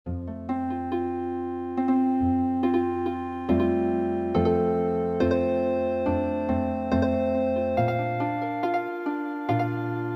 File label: Healing Harp, traditional